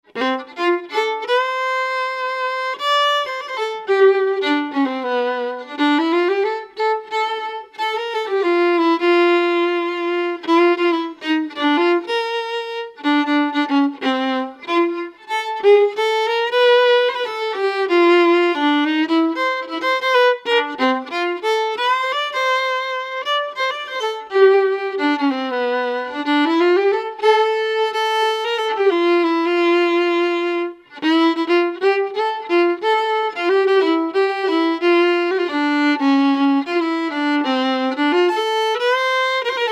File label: Jazz PU